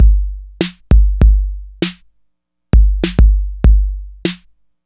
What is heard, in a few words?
Drum loops, Electro